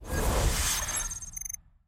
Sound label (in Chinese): 编队 财务报告页面入场; nba